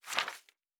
Book Page; Fantasy Sounds Bundle

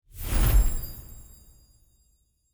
Positive Effect; Fantasy Interface; Fantasy Sounds Bundle

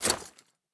Armour UI; Fantasy Sounds Bundle

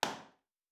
Nail; Fantasy Sounds Bundle; Craft

